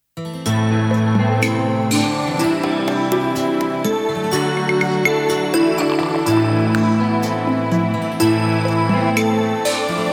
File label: Long me beat, playback